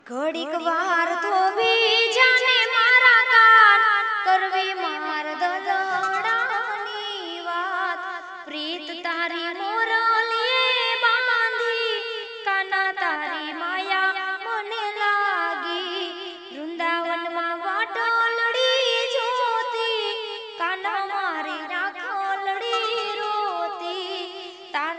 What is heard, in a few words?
Ringtone